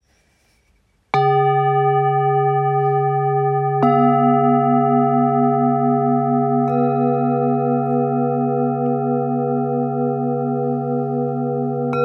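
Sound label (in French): Set, bols chantants tibétains; cérémonie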